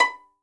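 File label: violin pizzicato B 4; AKAI S-Series CD-ROM Sound Library VOL-7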